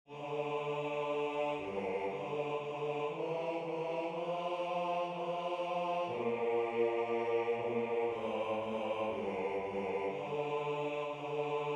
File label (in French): basse